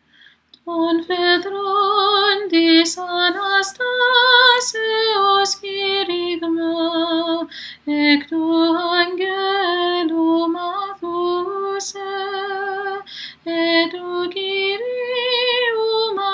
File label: Apolitikion Tone 4 Greek; To Faidron